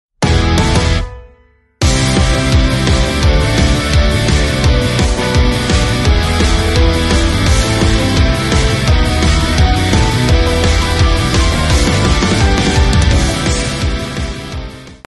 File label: Instrumental